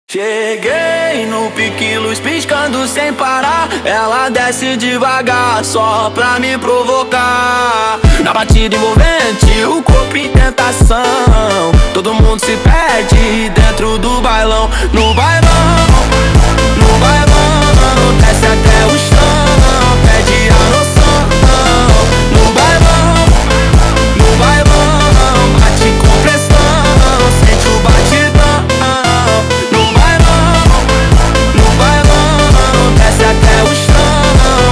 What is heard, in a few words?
Phonk